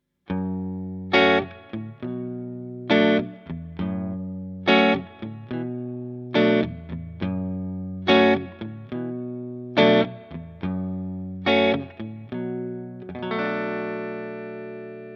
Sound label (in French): bogner clair sans rien manche bright; bogner alchemist